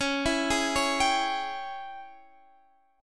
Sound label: Ab Major 7th Suspended 4th Sharp 5th Db